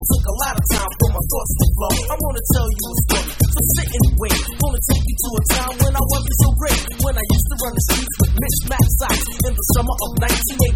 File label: Drum And Bass Mix